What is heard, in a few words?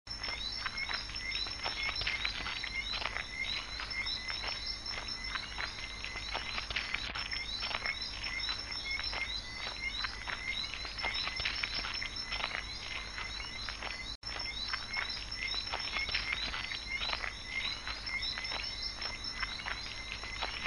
Nature walking on gravel with crickets quiet; Meditation 2